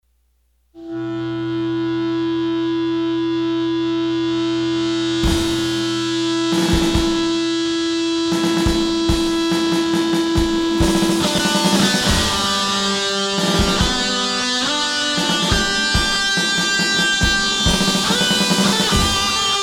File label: national anthem w drums